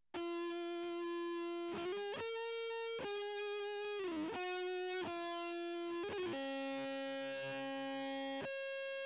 nodrums inst sim

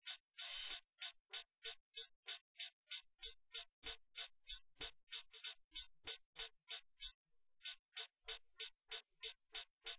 wdrums inst sim; SiSEC08; SiSEC underdetermined

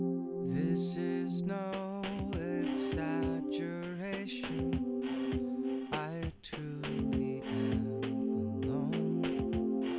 wdrums inst mix

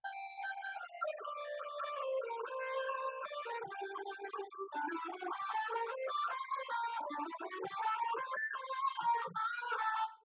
nodrums; SiSEC10; underdetermined

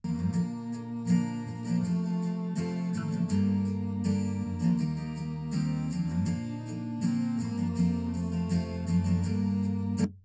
nodrums mix; SiSEC10; underdetermined

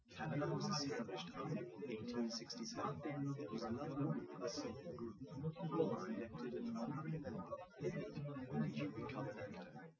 male liverec 250ms 5cm sim; SiSEC08; SiSEC underdetermined

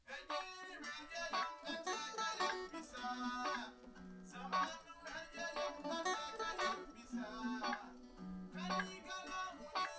nodrums liverec

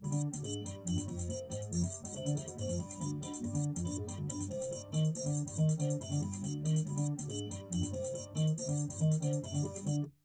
wdrums; SiSEC10; underdetermined

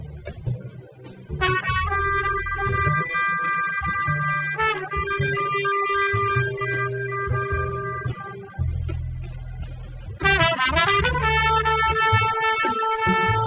Trp; ICASSP11; DUET

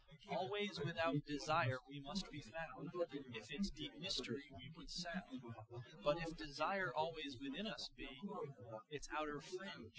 male inst src

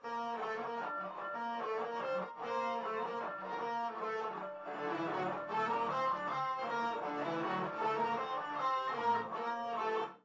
no drums, 380ms 4cm; SiSEC11; underdetermined

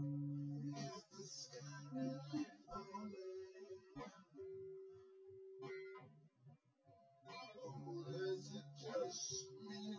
no drums synth conv 250ms 5cm sim; SiSEC08; SiSEC underdetermined

